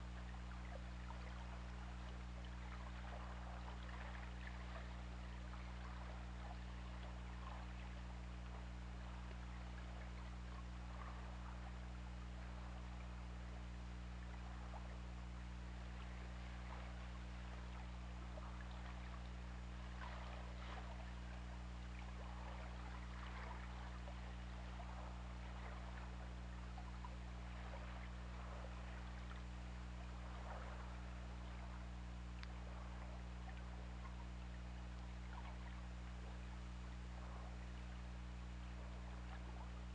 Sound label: Orca; OrcaLab